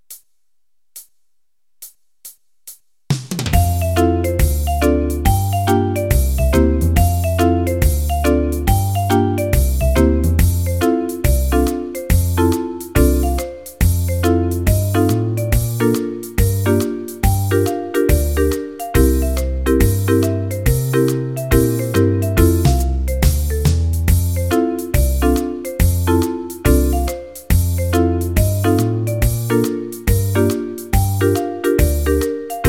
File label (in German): jingle bells audio ohne Melodie